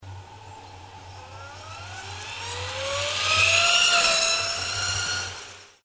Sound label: Acceleration